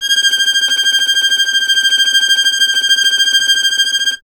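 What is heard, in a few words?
string violin tremolo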